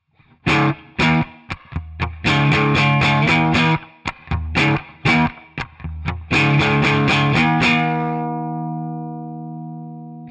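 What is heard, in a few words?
reverb rumble; tech21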